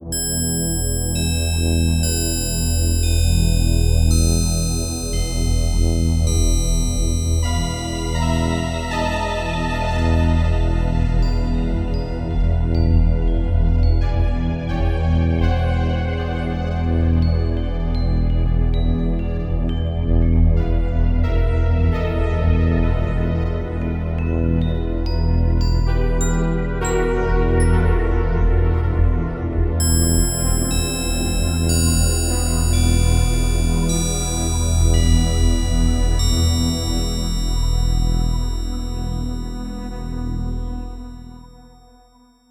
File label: dungeon; PC Gamer IT CD 31; REALM